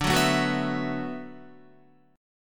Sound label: D 6th Add 9th D, Guitar; D6add9 strummed; JGuitar